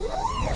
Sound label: move; Apotheorasis